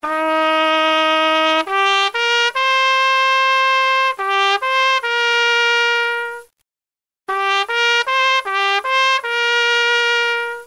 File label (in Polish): hejnal